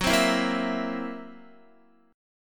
Gb 7th Flat 5th, Gb7b5 chord, Guitar, 4E,4A,5D,5G,5C,6F; strummed; JGuitar